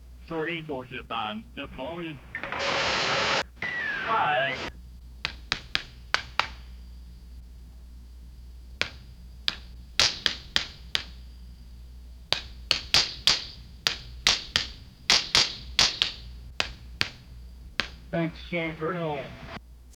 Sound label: create tense tactical sou